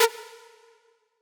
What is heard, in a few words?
synth